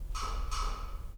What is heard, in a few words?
wood block med